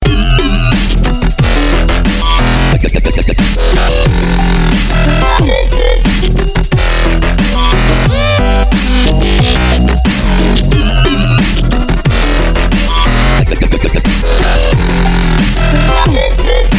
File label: Dubstep, ringtone